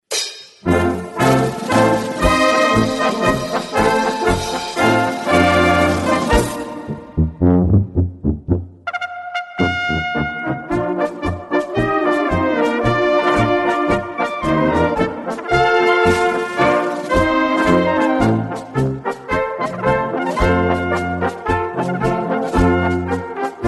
Blasmusik

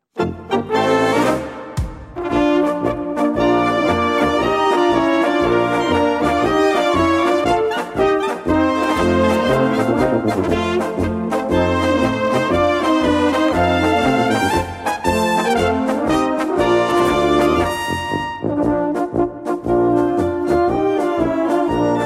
Corina Polka

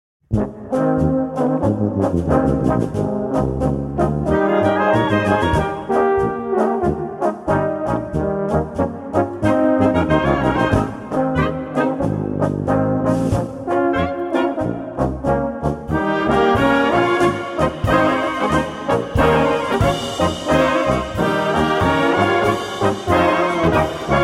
Brauhaus Polka

